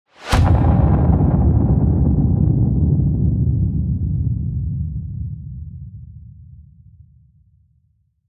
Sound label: Never Ending Fall, sound design trailer tools; Gothic Storm Music; Brain Warp (Sound Design Trailer Tools) 2011